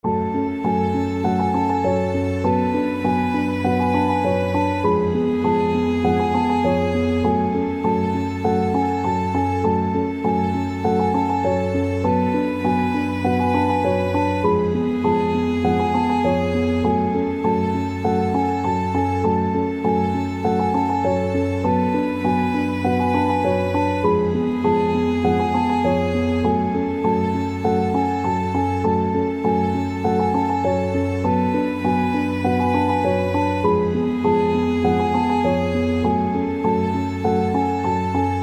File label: Sad Piano and Violin